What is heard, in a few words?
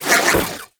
Archon Whip Spin Whoosh; Warframe; The New War; Nira